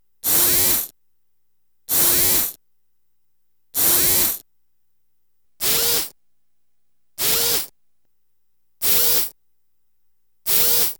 Drill Servo Small; Home & Office

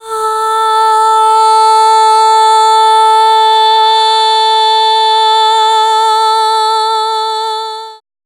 Ah Vocal - Fm 60Bpm; Construction Kits; VOCALS; Ghosthack; SkyRealm; Kit 04 - High Rock View

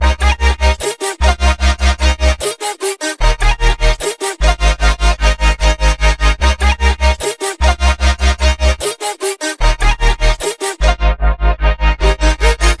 Wake Up Call 150 BPM C Min; Melodies; Cymatics - Immortal Production Suite Beta

